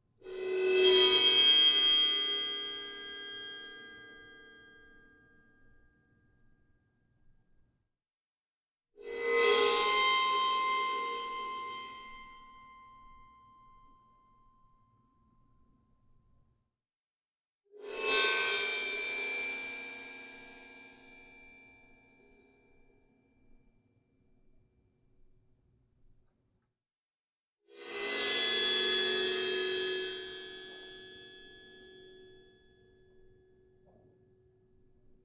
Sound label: Instrument Cymbal Bowing High Pitch Ringing; ShotgunMic; Guild Wars 2 (Original Foley Soundtrack)